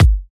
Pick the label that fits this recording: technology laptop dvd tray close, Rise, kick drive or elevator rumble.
kick drive